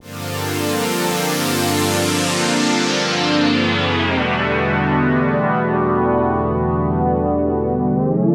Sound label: 115 G Synth Black Tea; Zero-G; Future Wave